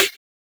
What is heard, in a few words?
Snare, techno drum